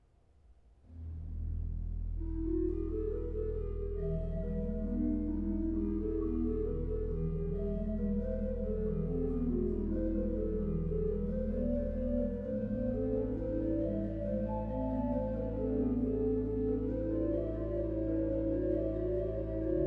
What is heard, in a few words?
flei wielki principal